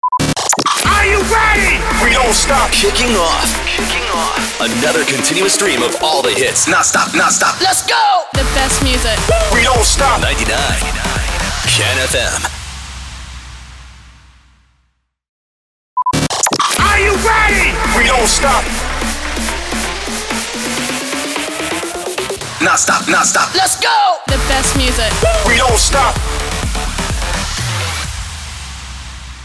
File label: SWEEPER MUSIC KICKOFF INTRO